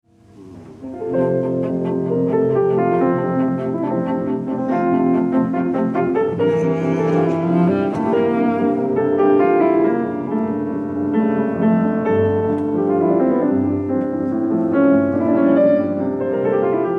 Cello Sonata in F Major Op. 5 No. 1 I. Adagio sostenuto Allegro Presto; Beethoven